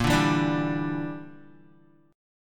Bb 6th Flat 5th Bb, Guitar; Bb6b5 strummed

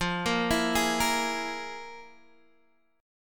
F Minor Major 7th Double Flat 5th, Guitar, Open G

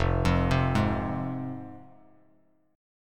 F Minor F down Bass Standard; Fm arpeggiated

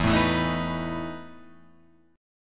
E Major 7th Suspended 4th, Guitar, Open F; strummed; JGuitar

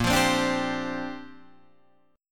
Bb 6th Add 9th Bb Guitar Open D

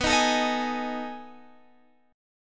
B 13th B, chord

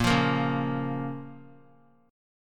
A sharp Minor A sharp down; strummed; JGuitar

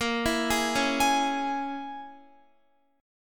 A sharp Minor 7th Flat 5th, down, Guitar; 4E,4A,5D,5G,5C,6F; arpeggiated

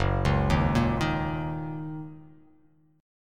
Fsharp 6th down, chord

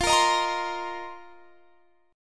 F 7th Suspended 4th F down; strummed

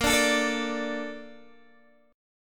Bb Major 7th, Bb, down, Guitar, DADGAD

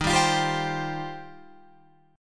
Eb 6th Add 9th, Guitar, Open F; strummed; 15,14,17,14,15,14

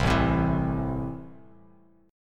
A Minor, Bass Standard; Am strummed; 8,0,10,9; JGuitar

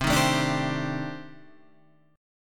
C Minor 6th Add 9th C; Cm6add9 Chord {8 6 7 7 8 8} strummed

